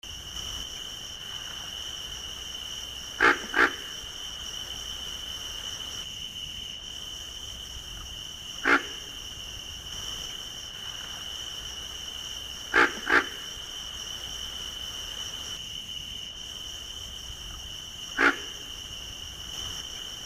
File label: frogs pig frog